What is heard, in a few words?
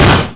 Gunshot